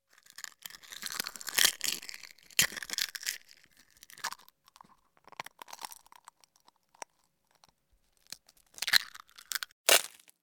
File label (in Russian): Skorlupa